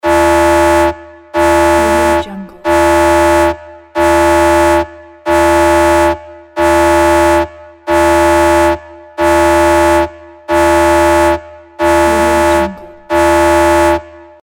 sci fi ship alarm; آژیر خطر کشتی; industrial sounds

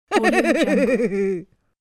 fool woman laughter; human sounds

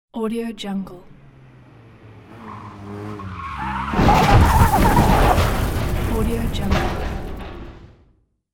car crash; تصادف ماشین; urban sounds

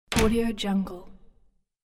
metallic door close; domestic sounds

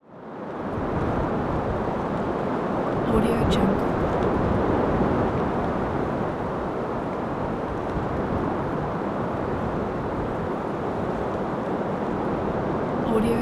wind forest

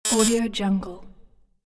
wrong answer buzzer notification

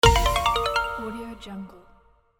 completion; game

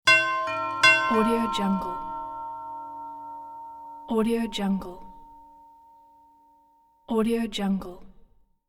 double doorbell chime; cartoon; زنگ قدیمی زنگوله ای درب

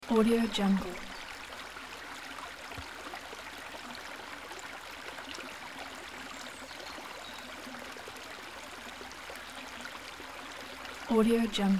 stream small birds animals subtle close up

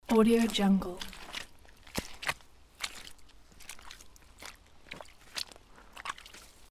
steps in sticky mud loop

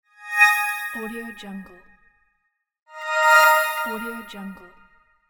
smooth message; interface sounds

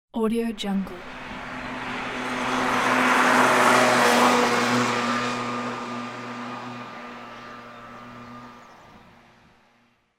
fast scooter lone drive by

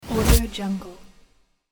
transition short sweep slap reverb; transitions and movement

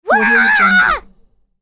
kid comedy scream; human sounds

